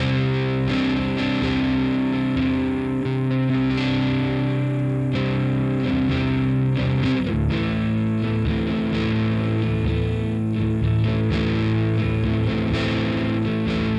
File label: Industrialectric 4046 m Arpeggiator without VCO